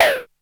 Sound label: TOM; 90 sSampleCDs; Crypton - DrumBase5000